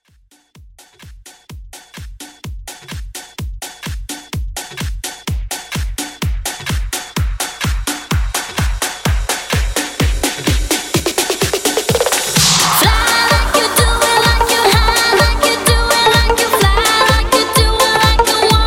House Club Mix 127 BPM

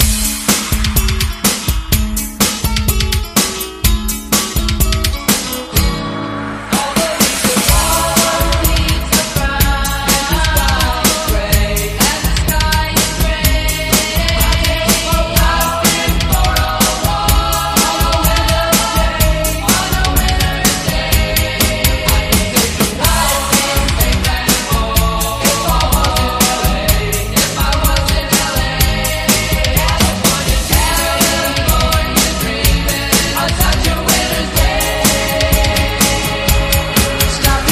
REDRUM 125 bpm Dbm

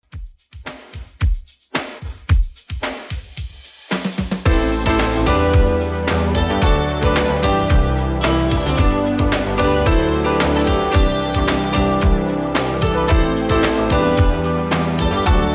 80s Redrum 111 bpm

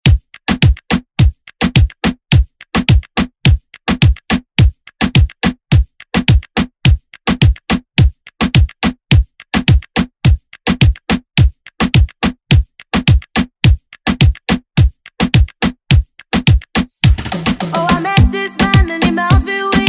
Moombahton

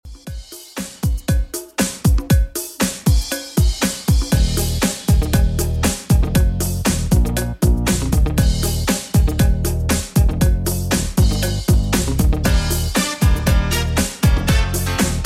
90s Redrum 118bpm

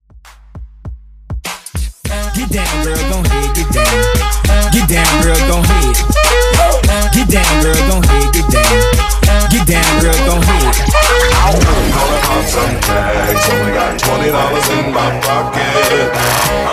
Extended Party Breakz 100 BPM

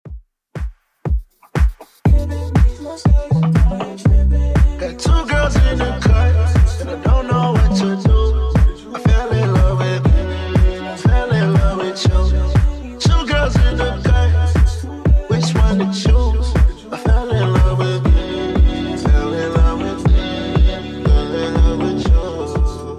Top40 Redrum 120bpm

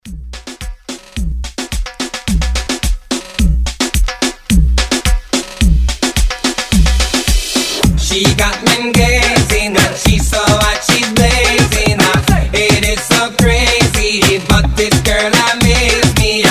Moombah Re Drum 108

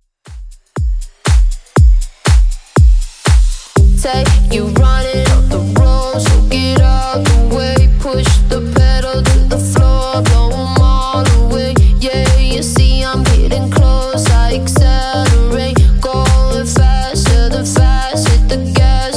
Top40 DJ Friendly Redrum 120bpm

